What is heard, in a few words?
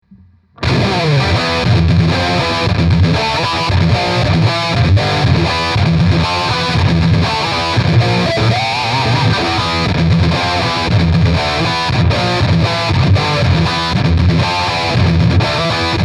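Rectifier Modeling, guitar amp; VOX Cambridge 50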